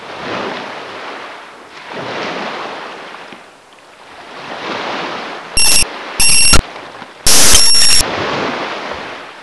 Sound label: wave